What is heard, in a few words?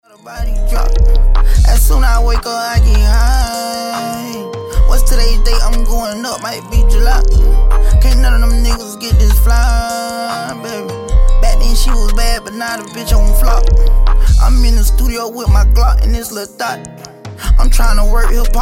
HIP HOP